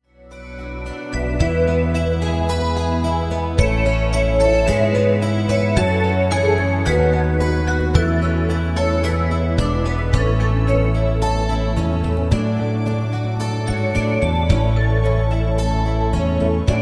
Playback ohne Floete

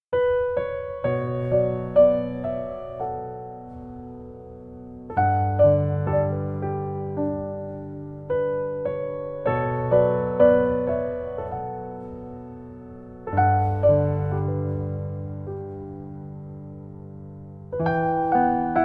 Instrumental

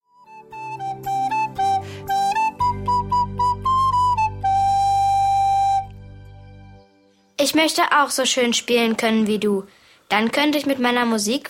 Floetenmelodie

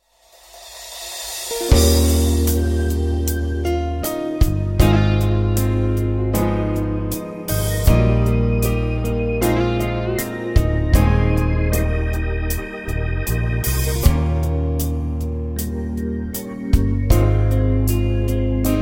Playback ohne Blaeser; Deep River

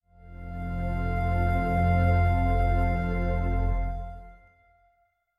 Zwischenmusik

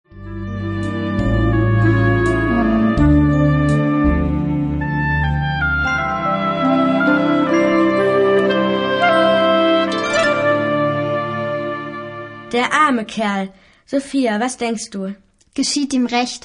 Zwischenmusik

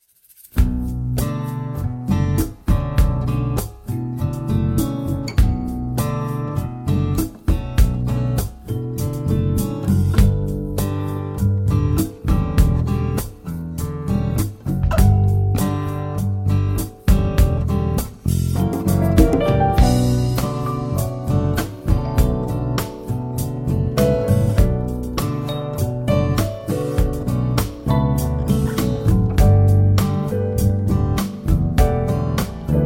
Tenor

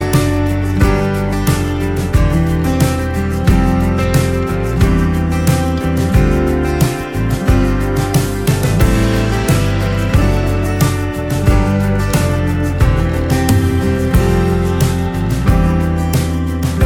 Playback ohne Backings

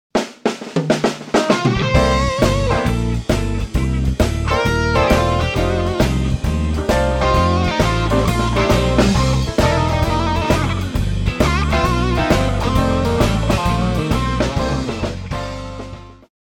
Intromusik

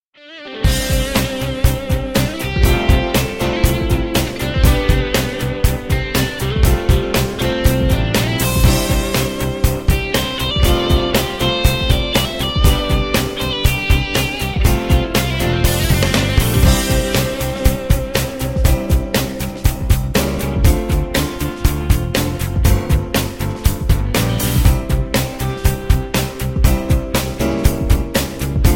Playback ohne Blaeser; Rock My Soul

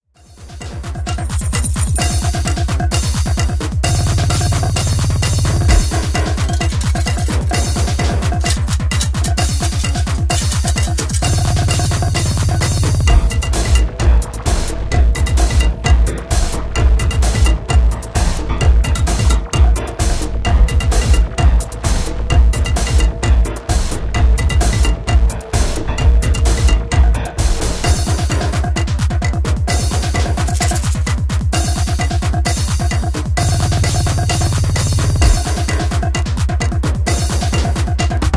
Playback Raeuberrap

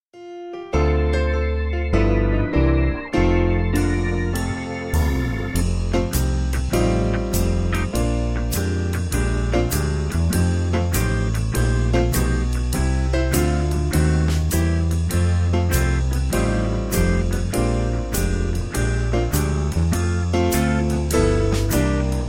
Playback ohne Blaeser; Swing Low, Sweet Chariot

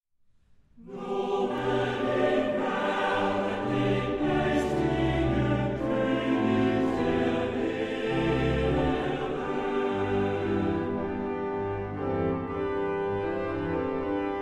Hymnus, Gemeindegesang; Bach-Chor Siegen

